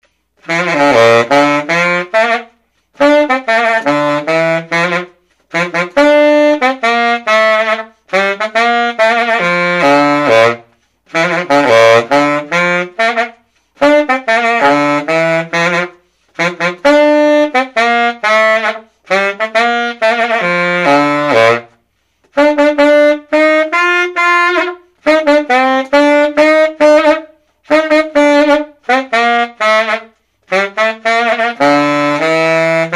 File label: Buescher Gold C Mel Sax; C Key